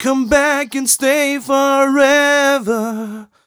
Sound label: m 127 mel a, Vocals